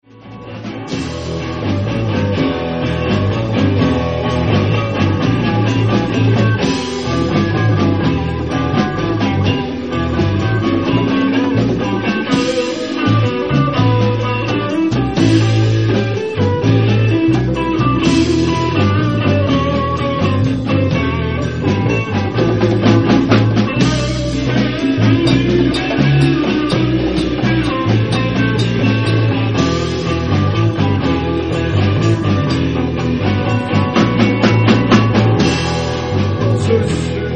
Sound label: angry blues